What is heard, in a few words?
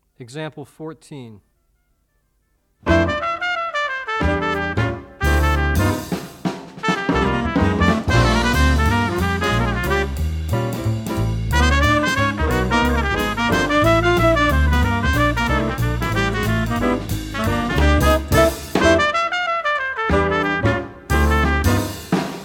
two horn audio examples